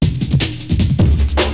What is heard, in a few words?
nice break with pitch change